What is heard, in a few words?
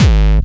Bass Drum Oh No